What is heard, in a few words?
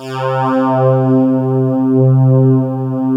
PULSATN, PULSE; PULSENSE